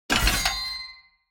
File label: ui player morgenstern break